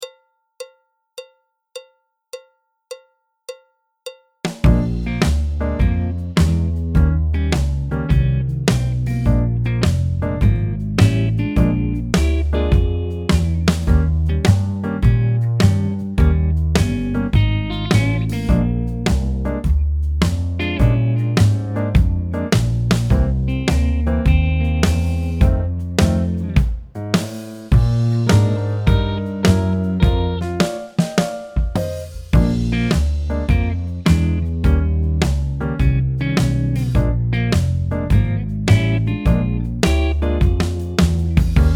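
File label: Blues backing track, Jazz; alto, trumpet, trombone; Jazz Syllabus Level 3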